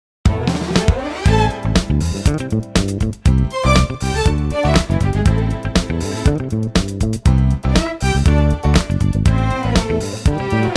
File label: discothque